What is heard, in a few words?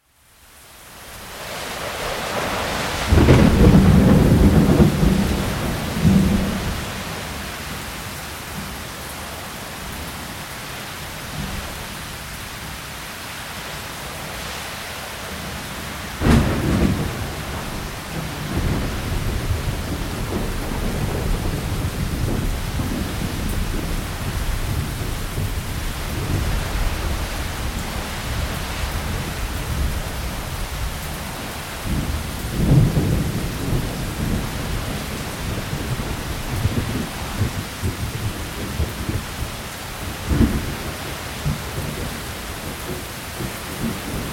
Thunderstorm